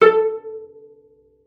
A4, CELLO PIZZ; Xsample VOL-5

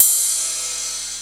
CYMBAL; Crypton - DrumBase5000

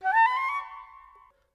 Flute; Sonic Foundry (Sony Creative Software); Orchestral VOL-2; Modern 13

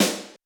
Roland U220 Dry Set; 90 s Sample CDs; 300 Drum Machines

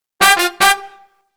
Disco Fever, BRASS-LICKS; Best Service ProSamples vol.48 - Disco Fever; AKAI

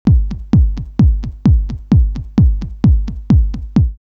909 BD Only 130, drumloops, DrumMachines; X-Static Goldmine

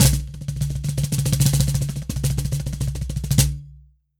drm, WORLD DRUMS; World Instruments

